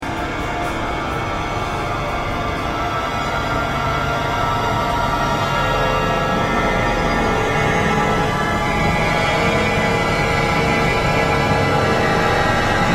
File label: Instrumental